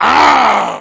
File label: backstab; phraxathity